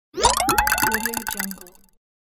magnetic strings

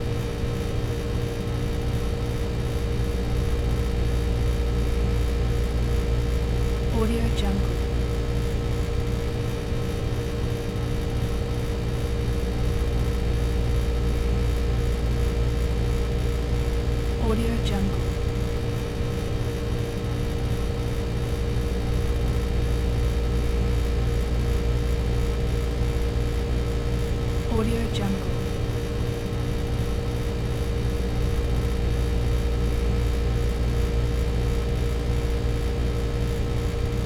ventilation

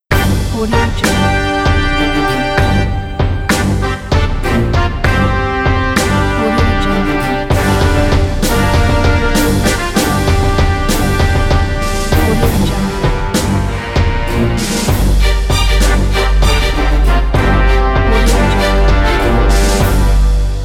brave movie trailer; soundhq

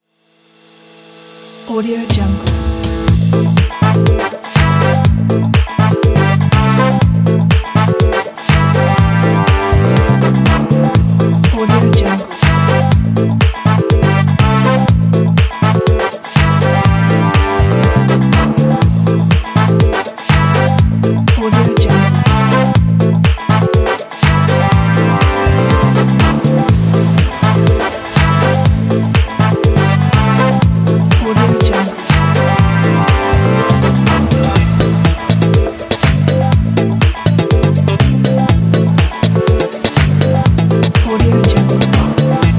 funky party; janna jj

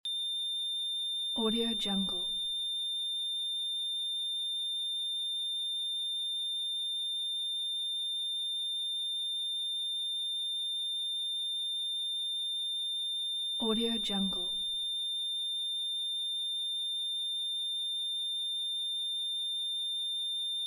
heart rate monitor with flat line

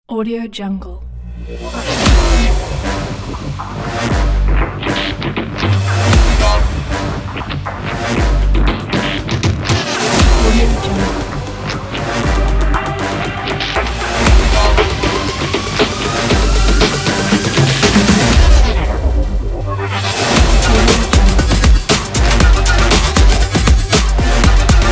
action breaks

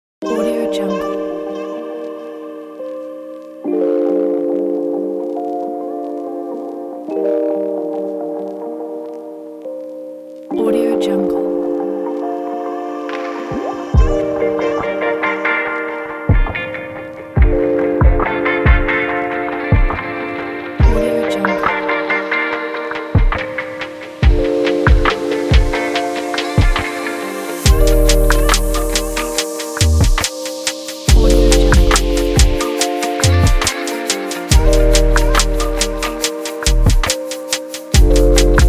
lounge

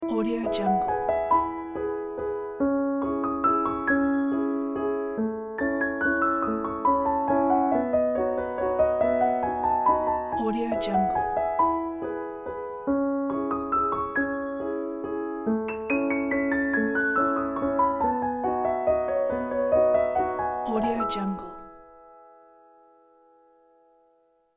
beautiful piano mini valse

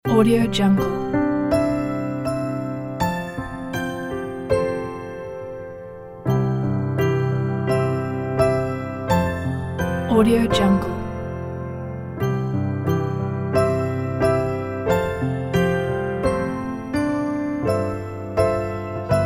soft christmas logo

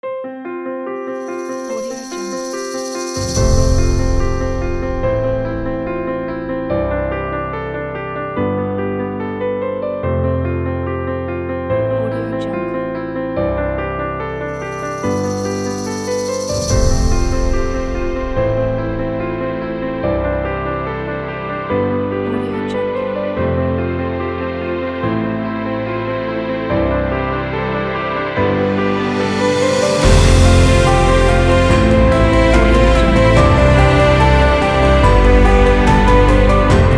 emotional piano cinematic orchestra

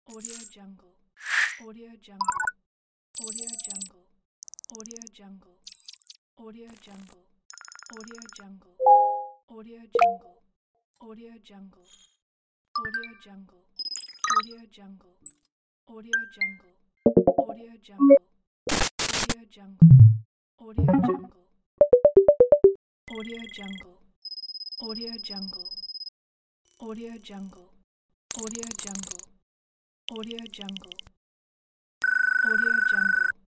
hud ui elements

